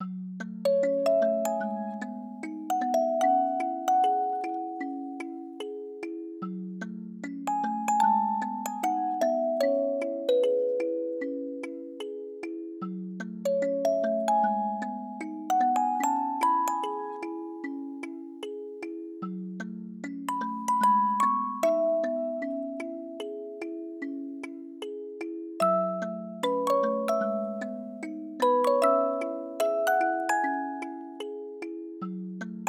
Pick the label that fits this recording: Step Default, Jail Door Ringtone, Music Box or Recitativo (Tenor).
Music Box